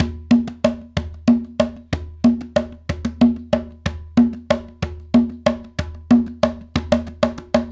Bembe 6/8, World Dance

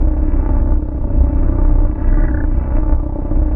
DATA BARGE; Spectrasonic Distorted Reality 1

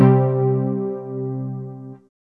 SYN ANA3; Best Service Gigapack 1

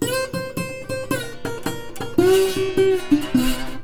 120, Blues Guitar; ILIO - Fretworks

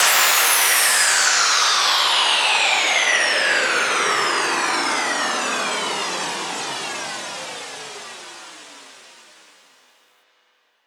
Sweeps Down

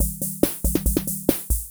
140 POPS

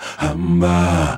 Male Voice, African Voices

